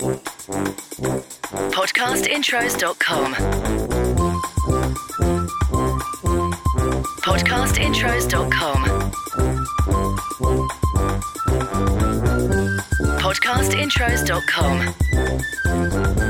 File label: Podcast Watermark FUN Funtime